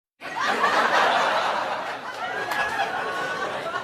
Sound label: small crowd laugh